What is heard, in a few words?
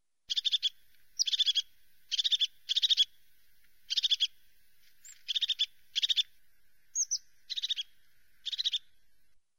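crested tit voice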